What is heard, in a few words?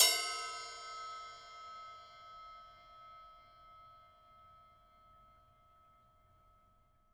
Ride Soft, StereoRoomKit; Software Studio Masterclass